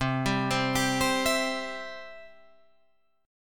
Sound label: C Major, Guitar Drop D